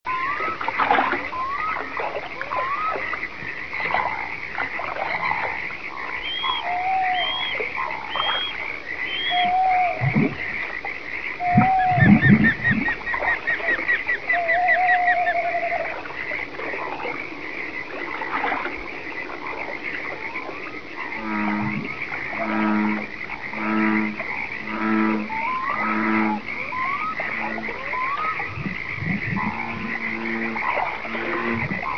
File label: docks; svencoop; amopa